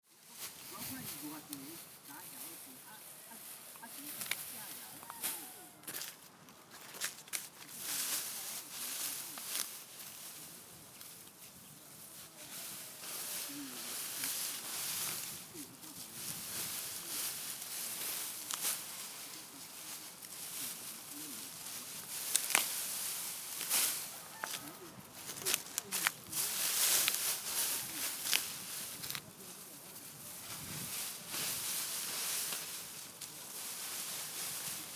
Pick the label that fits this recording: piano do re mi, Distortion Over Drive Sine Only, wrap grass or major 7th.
wrap grass